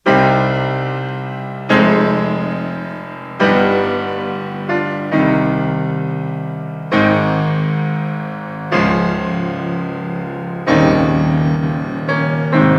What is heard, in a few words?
Preludes; Chopin; Op28; No20; Lympany